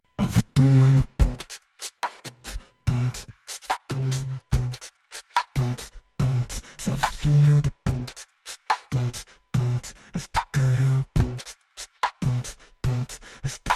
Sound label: Drums